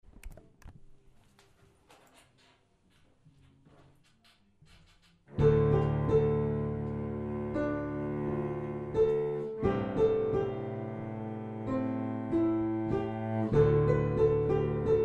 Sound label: CELLO PIANO ARRANGEMENT